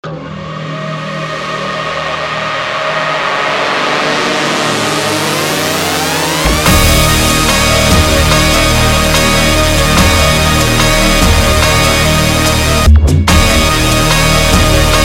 dubstep; Ivory - Outer Space Full Package; Dubstep风格采样包

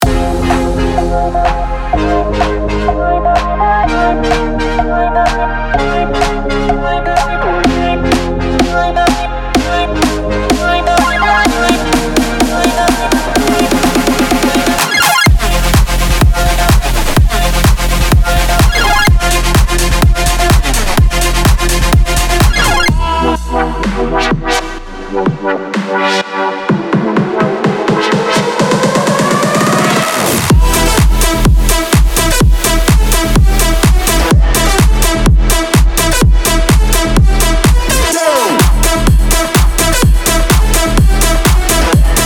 Future House Loop and One Shot Sample Pack; Epic Stock Media; Fire Future House

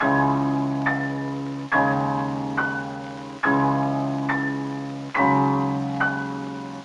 MIDI Chords Melodies; Trap; Monosounds Go2 Premium Beatmaking Sample Pack & Serum Presets

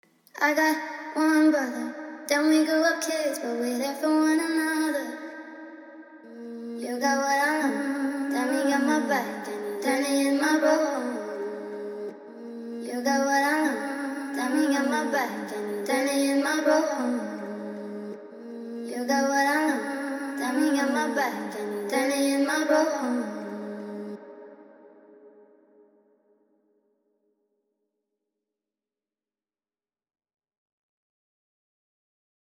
159 BPM G# Min Vocals; Trap; Cymatics MAYHEM Ultimate Trap Sample Collection; Brothers

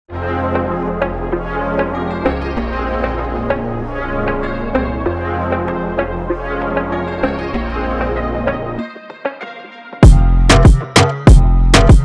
latin, Reggaeton; Cymatics PARADOX - Latin Collection

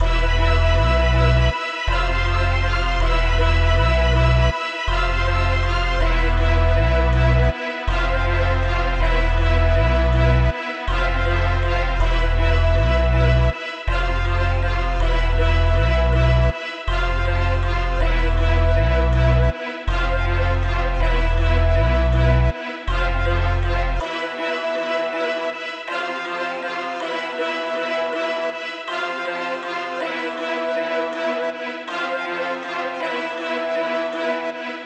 Energy 160 BPM E Min, Trap; Producergrind Trench Pack; 黑暗Trap

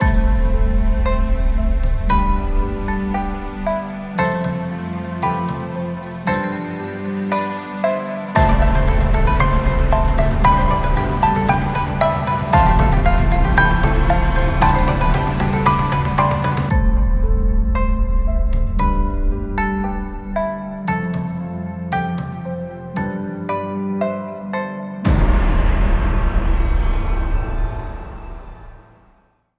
Pipa Orchestral Ad; 中国弦乐乐器; 琵琶独奏; IX sounds; Strings of the Orient: Solo Pipa v1.0 KONTAKT

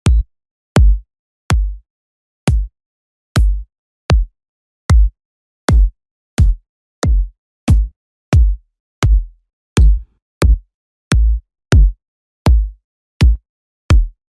kick, EDM; Ultrasonic Presence EDM Kicks Sample Pack

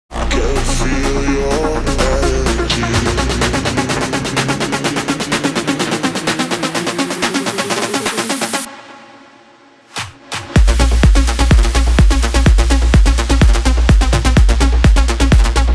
Intensity, Future Rave; The Producer School; Future Rave Bundle; Big Room

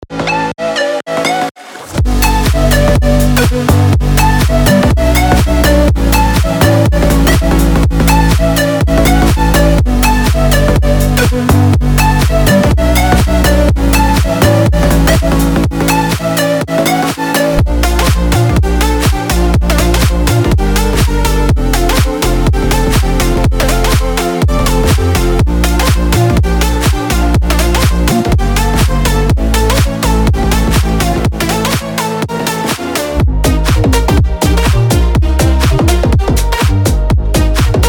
Drop Mix, Future Bounce; Disformity Future Bounce

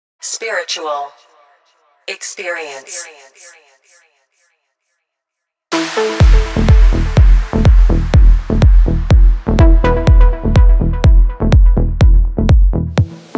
Astral, Melodic Techno& House; The Producer School

